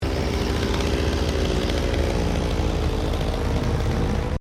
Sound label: Chainsaw idling; Standard chainsaw operating; Saws; Machines